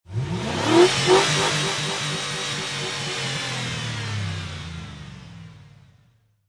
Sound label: Truck 5.3 Liter Rev Multiple Engine Perspective; Auto, truck, motor. 5.3 Liter Truck / Lorry. Rev engine multiple times, pumping the gas pedal.